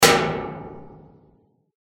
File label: trap close, beartrap; modified traps